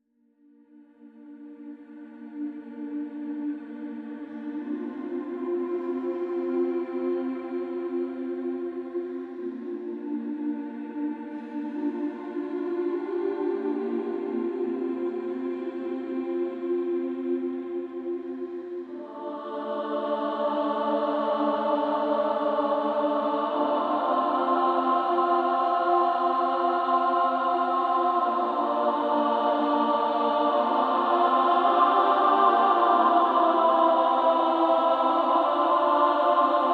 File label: Pre-Final Battle Choir; Chained Echoes